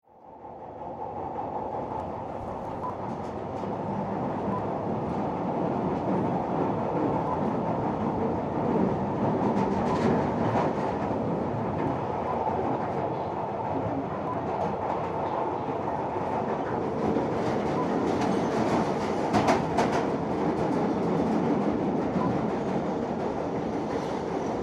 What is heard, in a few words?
Tube Onboard Arrive; 1min14s